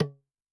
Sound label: Tabla BV, Yamaha DTXpress-II, Perc